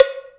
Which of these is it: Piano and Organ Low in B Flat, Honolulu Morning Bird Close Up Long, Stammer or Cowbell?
Cowbell